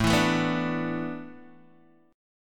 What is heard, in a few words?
A Suspended 2nd Suspended 4th down Guitar Open D